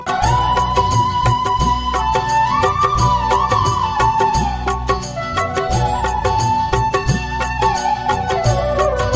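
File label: bansuri instrumental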